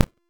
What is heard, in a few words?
Crack Impact; Warframe; Warframes; Khora